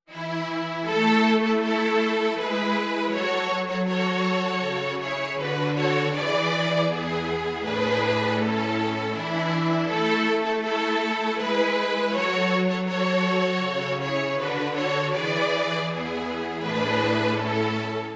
o christmas tree string, jazz carol for string quartet